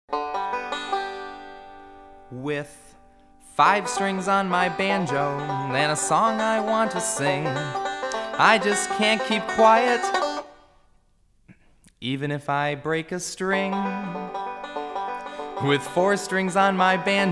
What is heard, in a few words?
5 Strings On My Banjo